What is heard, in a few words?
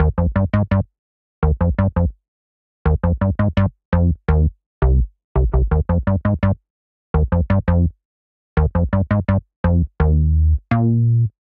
Eb Major 84 bpm, Bassline; Tonal; MIDI Clips; Tango Plush; Drive and Glow; Ableton; Factory Packs